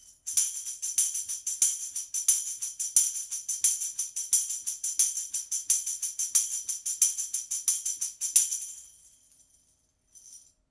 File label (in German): schellenkranz; tbone rb500